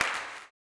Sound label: CLAP, Blues Soul; Spectrasonics Vocal Planet